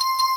count Up; asgardian stones

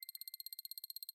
upgrade xp amount gain; my Buildings; City Verse Tycoon

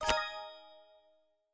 Card Match; Microsoft Solitaire Collection

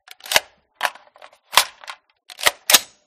reload; resurviv

